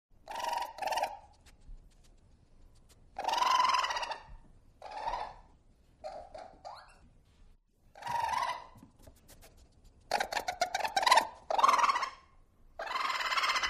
RACCOON TRILLS SNIFFS SNORTS GLOTTAL TRILLS; wildlife sounds

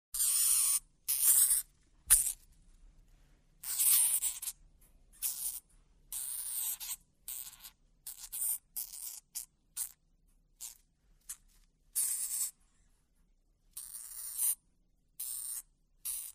HAMSTER DWARF LITTLE BUSY SQUAWKS; wildlife sounds

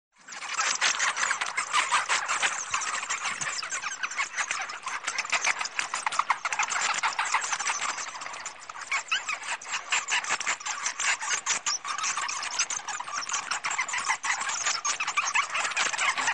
BAT FLOCK LARGE FLOCK VOCALIZING; wildlife sounds

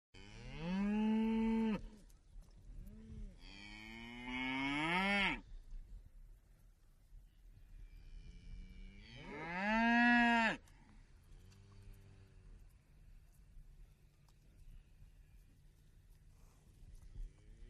COW FIELD OF COWS GRAZING; wildlife sounds

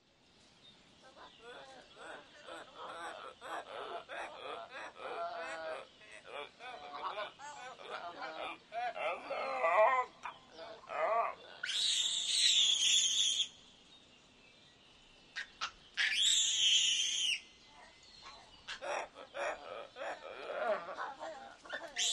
MONKEY FIGHT GROWLING SCREAMS; wildlife sounds

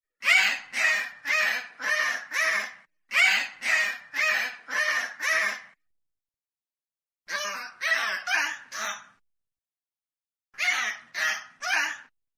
DOLPHIN DISTANT CALLS; wildlife sounds

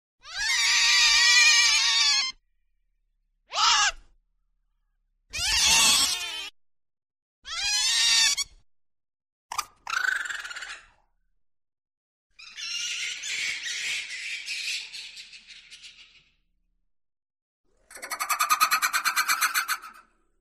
SHRIEKS 7 CRIES, wildlife sounds

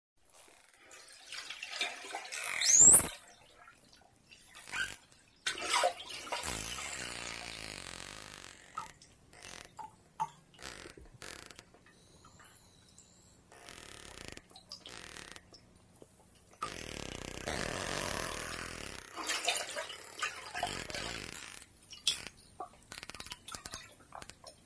DOLPHIN CLICKS RECORDED W HYDROPHONE; wildlife sounds

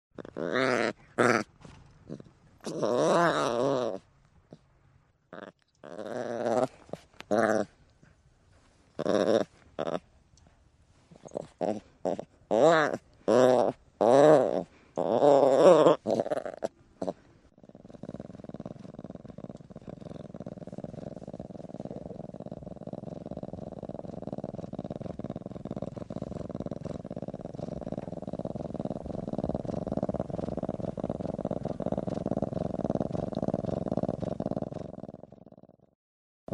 LYNX BABY GROWLS THEN PURR; wildlife sounds

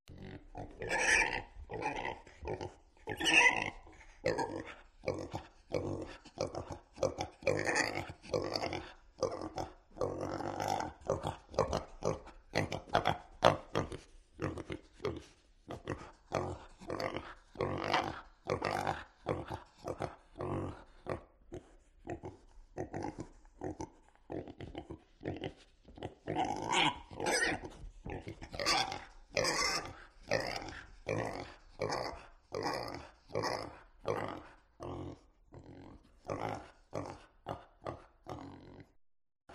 PIGS ADULT CU GRUNTS SOME SQUEAL; wildlife sounds

